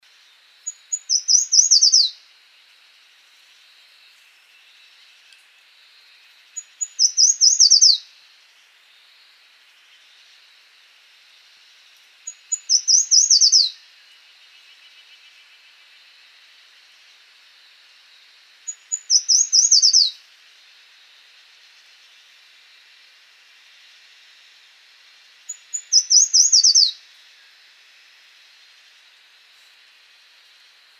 Sulphur breasted Warbler Phylloscopus ricketti

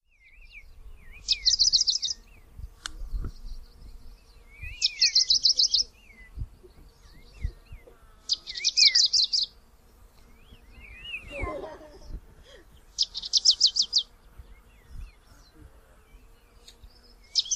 Alpine Leaf Warbler Phylloscopus occisinensis

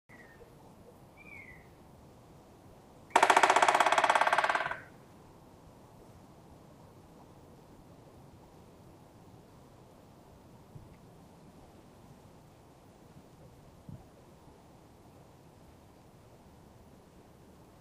Black Woodpecker Dryocopus martius

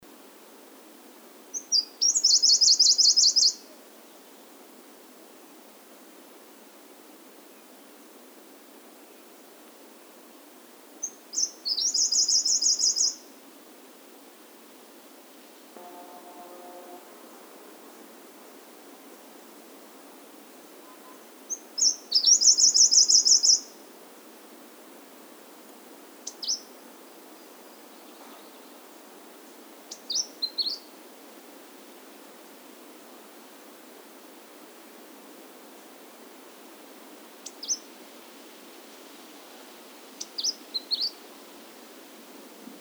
Claudias Leaf Warbler Phylloscopus claudiae

